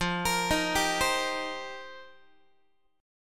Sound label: F 9th Suspended 4th F Guitar, chord; arpeggiated; JGuitar